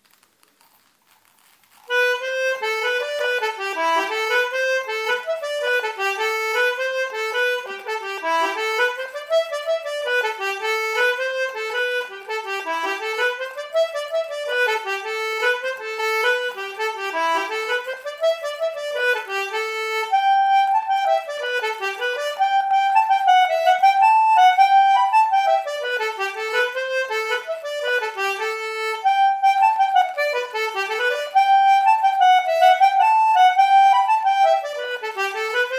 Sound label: jig, bandoneon; young tom ennis